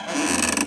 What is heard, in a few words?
squeeks, ambience; gearbox